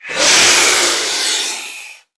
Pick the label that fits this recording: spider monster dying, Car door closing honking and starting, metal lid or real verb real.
spider monster dying